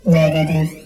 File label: negative